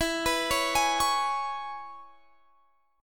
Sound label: E 6th, down, Guitar, Standard; arpeggiated; JGuitar